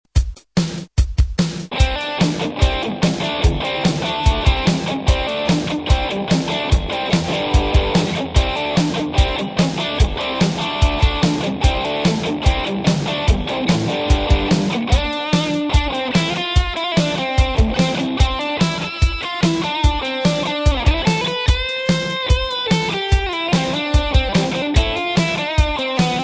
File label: rp200 distort